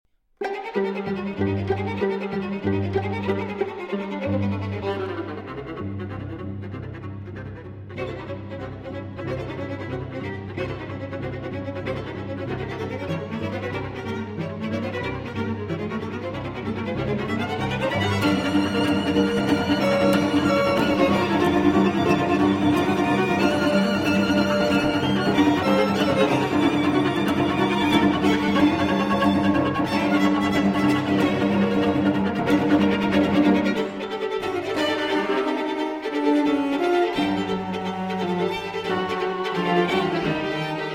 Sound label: Pieces for String Quartet; Schulhoff 5 Pieces For String Quartet - No. 5, Alla Tarantella